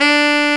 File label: SAX TENOR; 4way; Roland L-CDX-03 Disk 1; Tenor V-sw